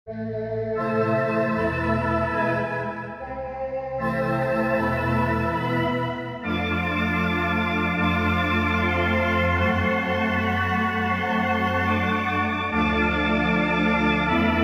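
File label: Voice Organ, Korg MSC-1S; VoiceOrgn; Korg MSC-1S Variety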